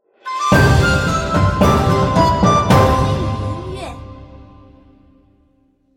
胜利; 我欲封天

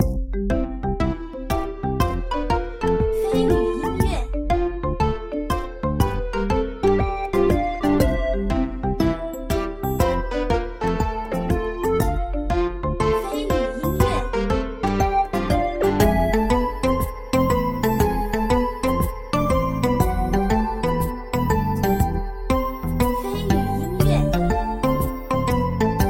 主界面 轻松 休闲

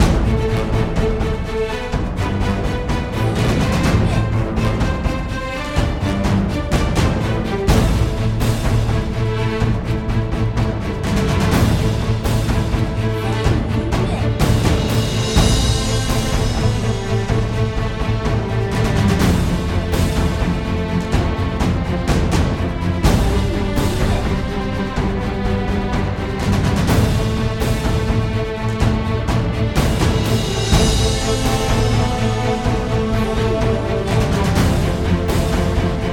战斗音乐

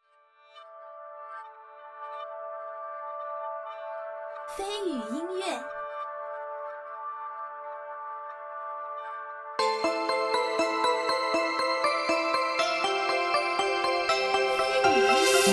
轻激动-日系-战斗

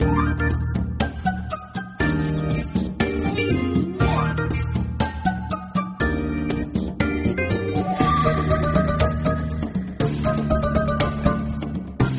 标题音乐

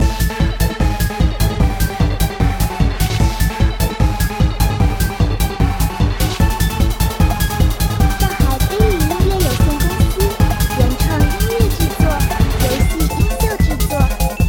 电子Q版紧张激烈战斗; 超连英雄

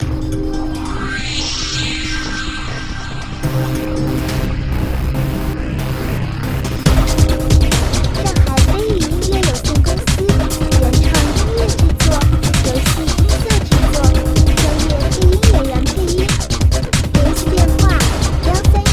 电子激烈紧张赛道

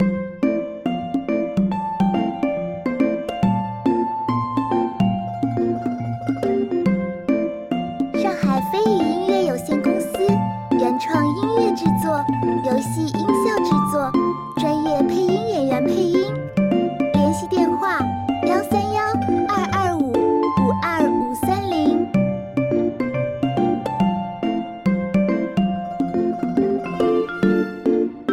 活泼 轻松 欢快 中国风

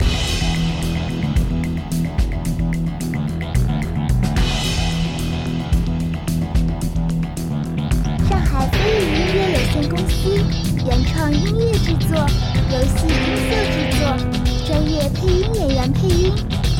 紧张节奏 主界面

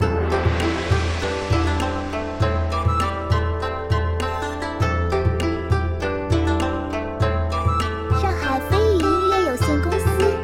舒缓轻快; 土豪斗翻天

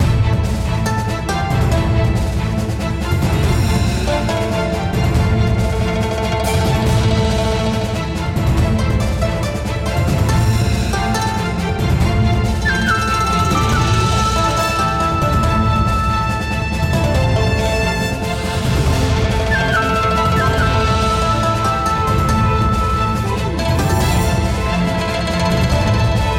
大boss战斗音乐

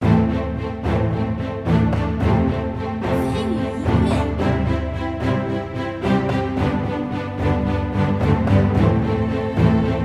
战斗

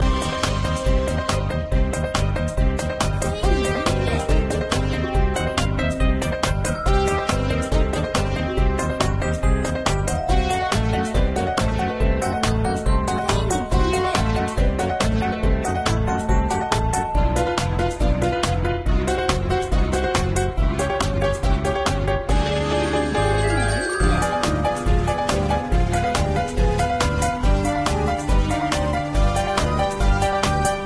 战斗界面

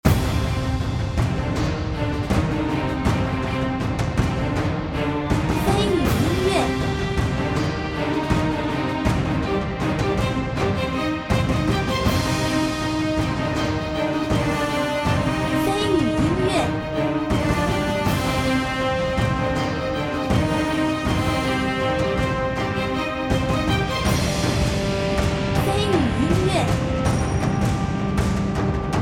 沉重-凄凉-压迫

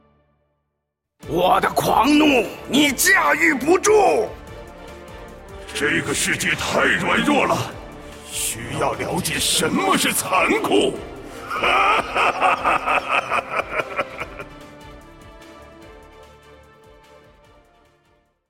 男 BGM

